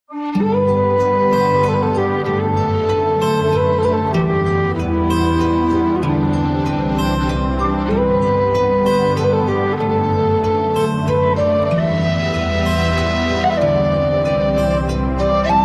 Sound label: Celtic Music